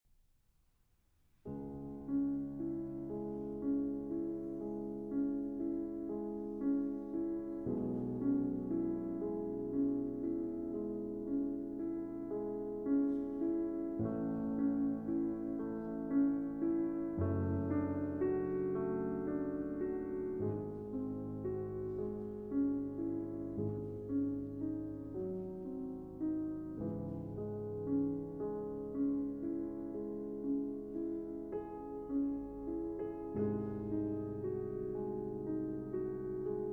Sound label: Piano Sonata No. 14 in C-Sharp Minor, Adagio Sostenuto